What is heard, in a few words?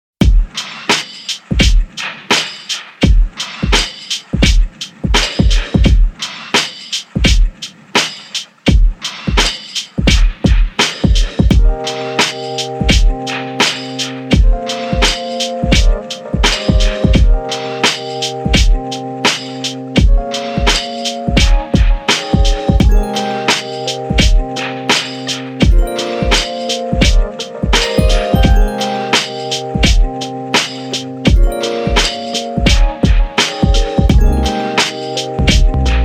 Kpop Eb 85; Monotree